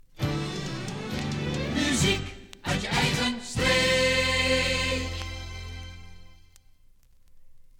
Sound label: topformat maxi jingle single